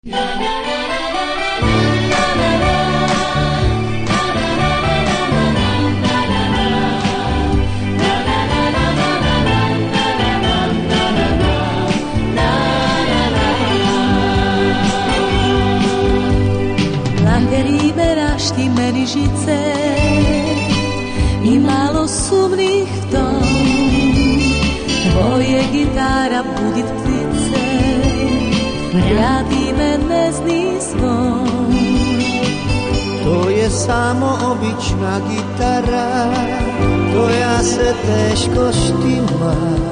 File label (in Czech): Gitara gitara